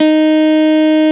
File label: Jazz Guitar G2